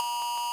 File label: xp gain; Adventures in Lestoria; action RPG game; Sig Productions